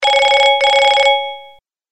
old british phone

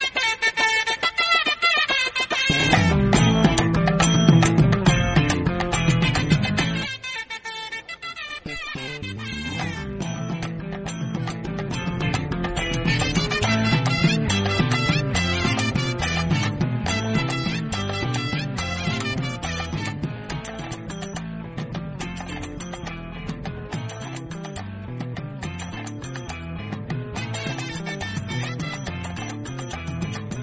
comedy bgm tamil; vip

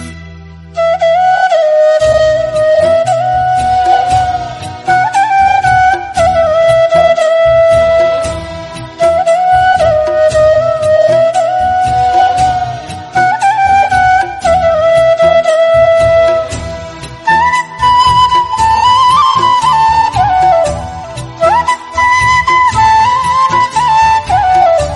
flute

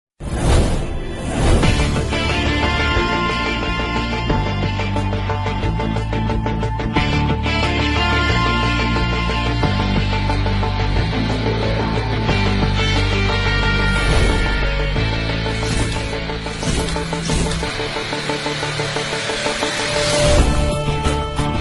background music; attack on titan

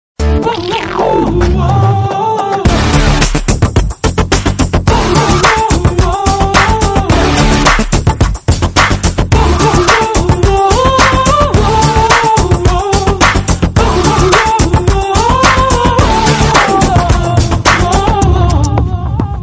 movie bgm; boys

